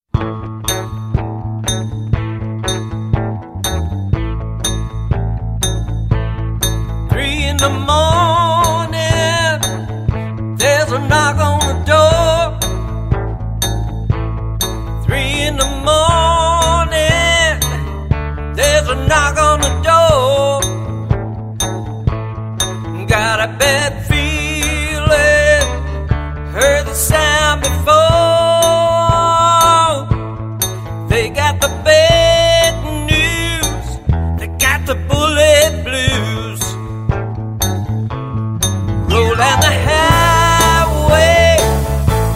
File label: Blues